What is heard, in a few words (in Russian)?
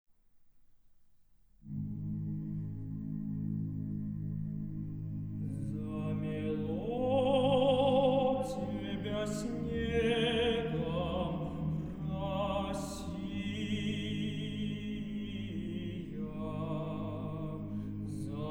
Хор